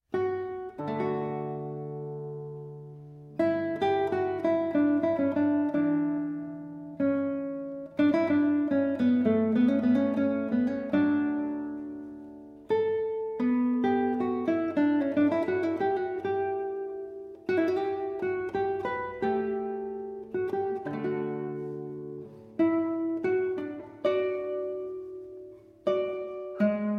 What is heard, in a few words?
Cello Suite in D Major, Allemande; J.S. Bach; BWV1012; Arr. Slava Grigoryan